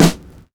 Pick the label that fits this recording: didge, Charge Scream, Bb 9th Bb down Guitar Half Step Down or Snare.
Snare